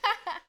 Laugh child

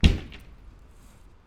Wood On Mattress

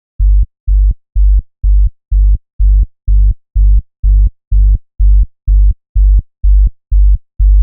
Dark Progressive Subbass

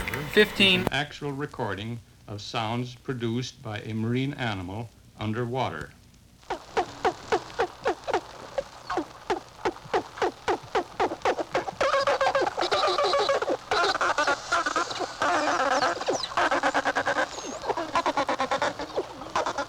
Dolphins Underwater

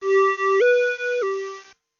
Ambulance

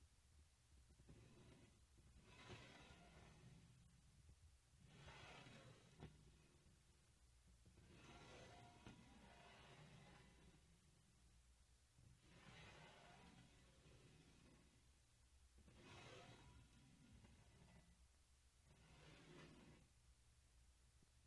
Sliding Foley

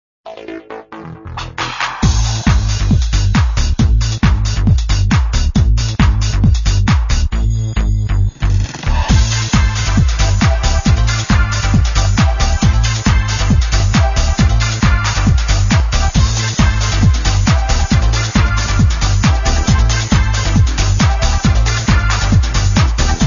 Techno

